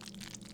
slosh; Lewd SFX

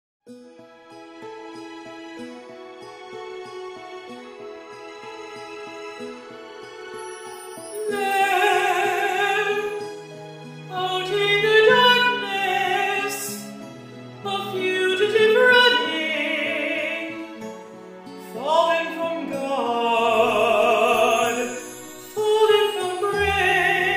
[F] Cover singing; Gender Swap Challenge; Broadway

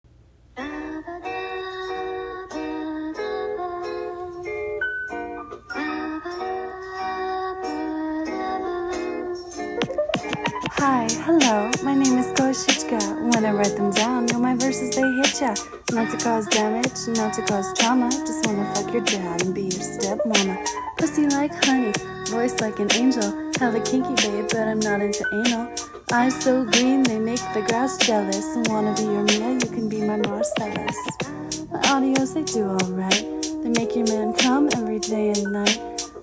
Rap

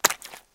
Smack; Lewd SFX

